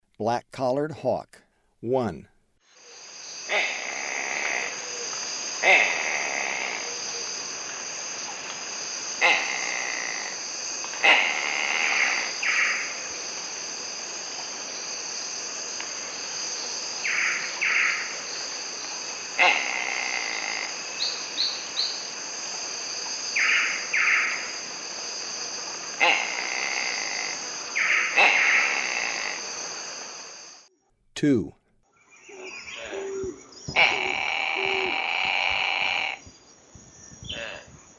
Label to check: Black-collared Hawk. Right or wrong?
Right